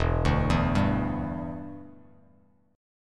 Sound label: E Minor 7th Bass Standard; arpeggiated